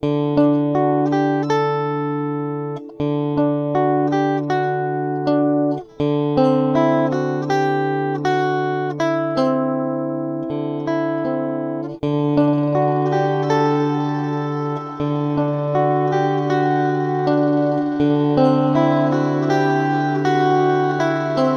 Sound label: electric guitar; droplet